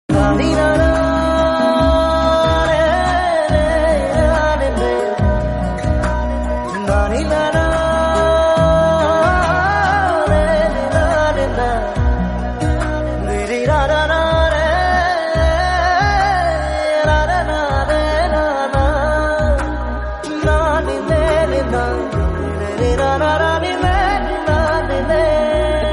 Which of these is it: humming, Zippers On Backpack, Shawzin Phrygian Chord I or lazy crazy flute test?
humming